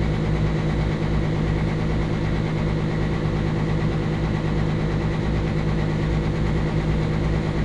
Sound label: generator loop; Titanfall 2